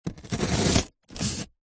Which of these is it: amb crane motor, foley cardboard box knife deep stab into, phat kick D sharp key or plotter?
foley cardboard box knife deep stab into